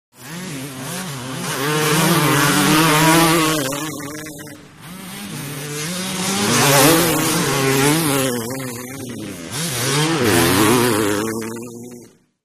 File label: Motorcycle Motocross; By 250 Cc ( Several ), Cu Fast Bys R / L, Shot Cu.